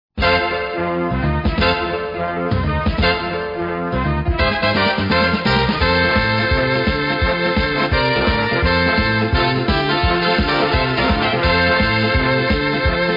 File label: Sax Boogie